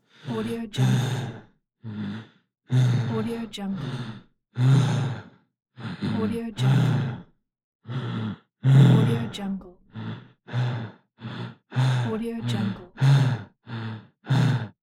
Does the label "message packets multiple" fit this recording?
No